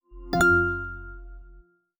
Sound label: iPhone 14 Startup